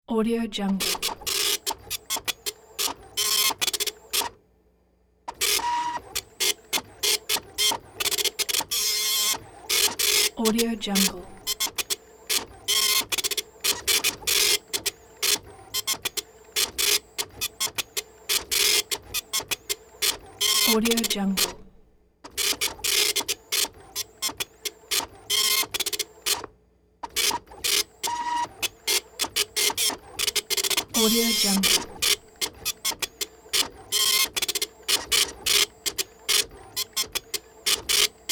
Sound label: printer